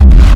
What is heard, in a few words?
Kick Rumble, Raw-Hardstyle; raw hardstyle kick pack 1